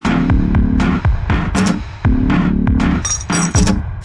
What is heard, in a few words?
survival music